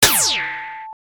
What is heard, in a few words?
lazer